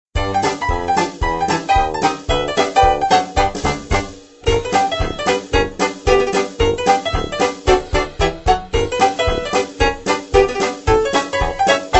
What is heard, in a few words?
song